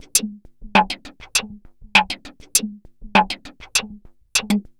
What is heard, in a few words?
reso gate, 100bpm loops; transx loops